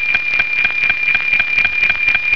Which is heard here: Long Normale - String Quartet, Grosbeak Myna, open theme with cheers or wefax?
wefax